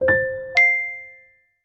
Lighthouse, notifications; LG; Folder-Y110S

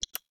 Lock

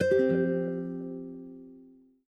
swingdown start guitar; LG Wing 5G SKT

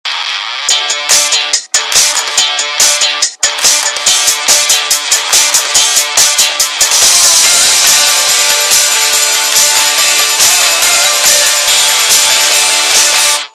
spike rock; Motorola EX300

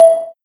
Video Record, ui

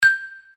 dial tone marimbas pound; OnePlus One China

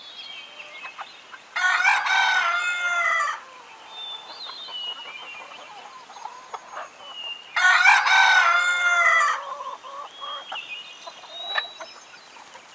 Rooster Alarm; Wiko Cing-Peax; alarms

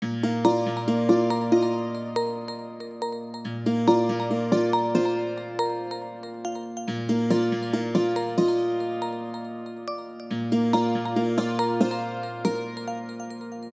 system ringtones; Amazon; Fire-Phone

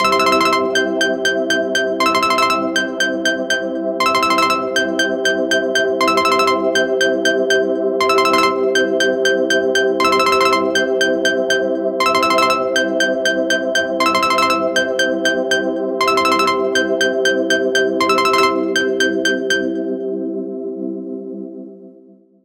Ding

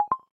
Charging Started, LG Stylo 4 Plus ui

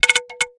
beak, notifications; Nothing Phone (2)

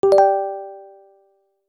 notification sapphire; BlackBerry OS-10

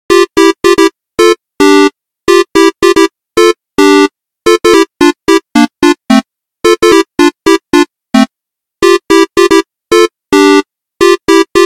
Beep Jazzy, ringtone; SKY IM-A890S-VEGA-Secret-Note

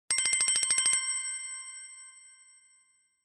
chime; Samsung Galaxy S10; DeviceKeystring

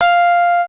Timer 1 sec, Camera; SKY IM-S610K

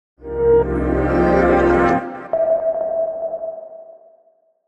boot audio; Freetel; RAIJIN-FTJ162E

